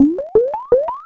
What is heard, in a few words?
Powerup Drop; New Super Mario Bros. Wii